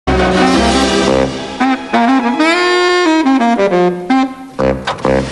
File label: solo